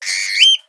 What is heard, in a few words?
trop bird; animal